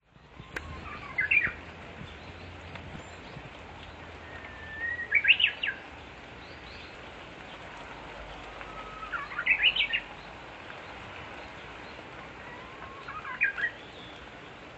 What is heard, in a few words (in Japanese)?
uguis